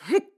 Attack; BOTW; Breath of the Wild; Link

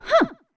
Hmph; Mamamu Yan: "Hmph!"

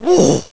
Gasp; Ezlo gasping; Zelda

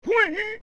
Wake Up; OOT

